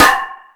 All Snares, Drum Machines

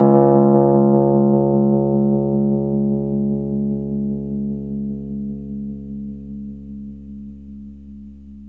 RHSM C#, Rhodes; 3D Sound; 3D Keys VOL-1

